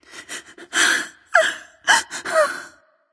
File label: boohoo light; smite; voicepack; hel